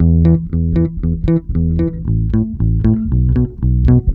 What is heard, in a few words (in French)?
PICK BASSE; USB Soundscan vol.32; Roots Disco; AKAI